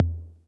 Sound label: Tom Low 808 Color